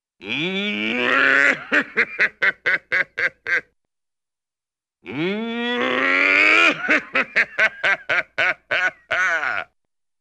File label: Evil Villainous Laugh, Cartoon; Hollywood Edge; Cartoon Trax